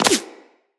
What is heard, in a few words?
Laser Impact Flesh; Weapons; Bullet Impacts